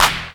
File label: raw acoustic snare sound c key